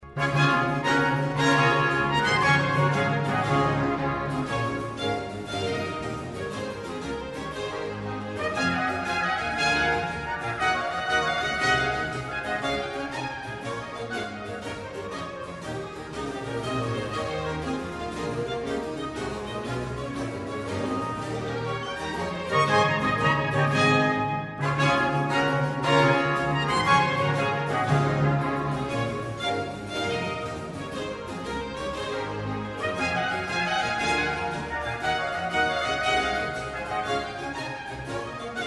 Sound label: orchestral suite no 3 d major gigue; bach